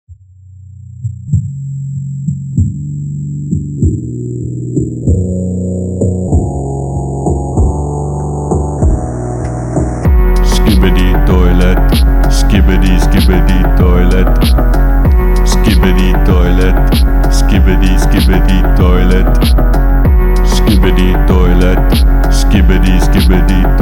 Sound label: phonk; Skibidi Toilet